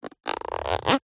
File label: household comb fingernail run across teeth